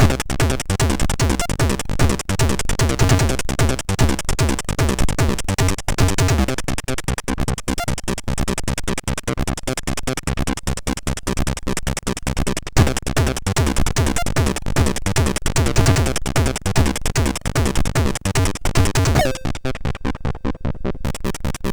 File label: Techno Rock, sid MOS6581R3; Early Tunes